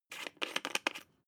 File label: glass pepper cap unscrew; berufe; gastronomie; soundpool